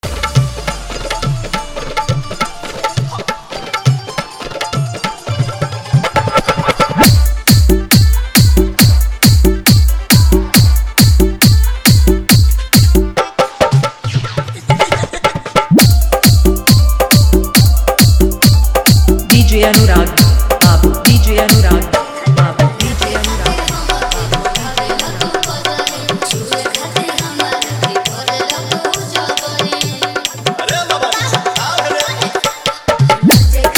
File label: Bhojpuri Song Bass Mix